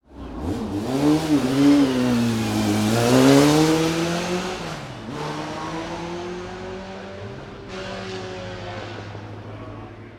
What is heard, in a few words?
Cooper-Climax T53